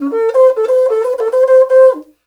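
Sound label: Afro Horn Phrase; Roland LCDP11 Africa VOL-1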